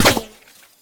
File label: stone, hit; tacz-tweak-pack; taczmechanics